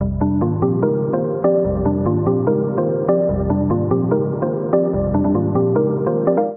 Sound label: Arp D Minor 146 bpm; MIDI Clips; Ableton; Factory Packs; Skitter and Step